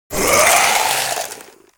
Bone Construct Enter Play

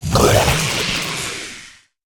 Chameleos Death

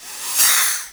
Attack Snake

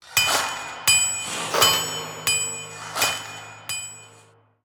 Chain Gang Play Underlay

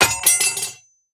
Dagger Underlay Death

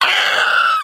Baby Kodo Death

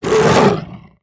Attack

